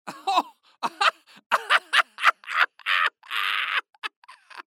Laugh

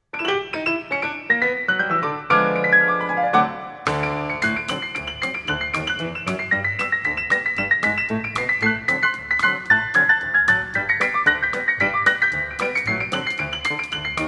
Black White Rag

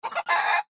rooster bauk

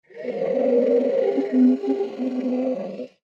alien

